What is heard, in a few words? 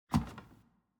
Footstep Wood Stairs